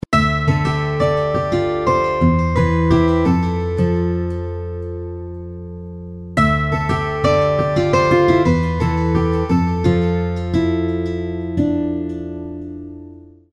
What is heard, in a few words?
Driftwood Ringtone, Six String; HTC